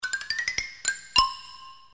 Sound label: falling up cartoon accent performed on xylophone